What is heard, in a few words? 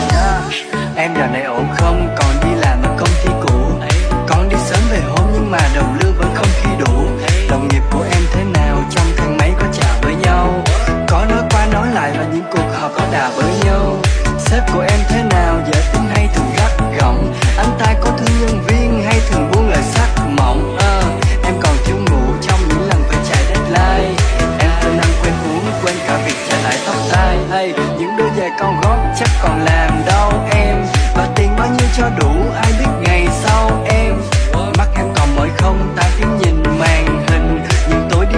remix tiktok